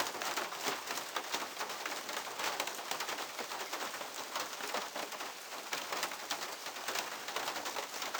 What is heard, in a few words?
Rain window; zps; Ambient